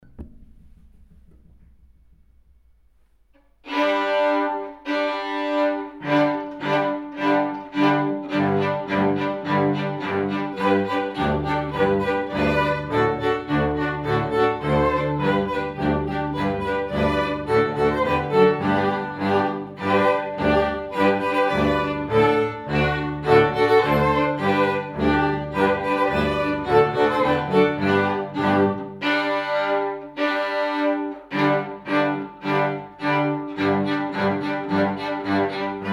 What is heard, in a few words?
skip to my lou strings